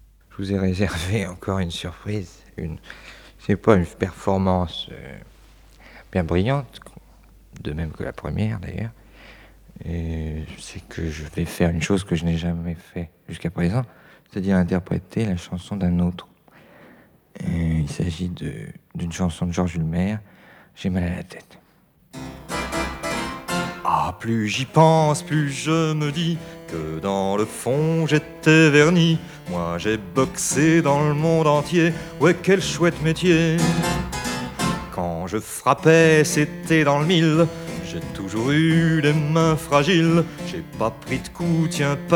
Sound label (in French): Piano Chant